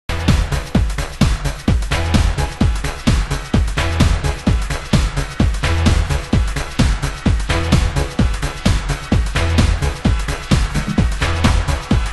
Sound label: trance